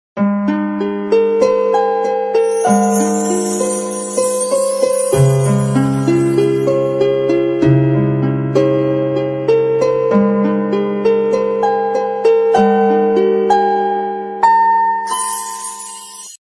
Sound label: soft harp